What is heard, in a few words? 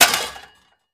Junk Thrown Box, junk crashes; Junk Thrown Into Box